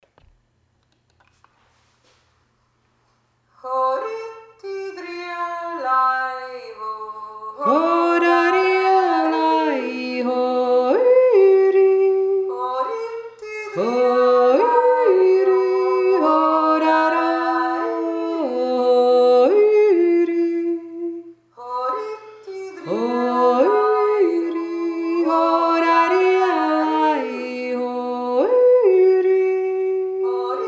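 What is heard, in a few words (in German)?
mitterberger zwei stimmen, jodler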